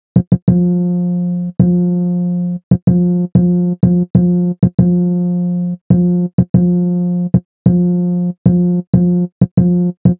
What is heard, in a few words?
Bass Combo